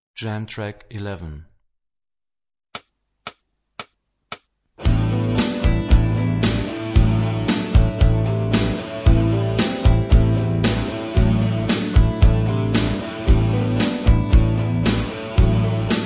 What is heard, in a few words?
Rock guitar; Rock gt Secrets